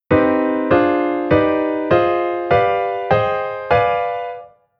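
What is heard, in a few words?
Chord Diatonic Minor Harmonic Minor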